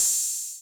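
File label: Open Hi-Hat 808 Color B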